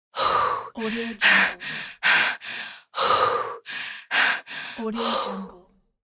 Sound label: female out of breath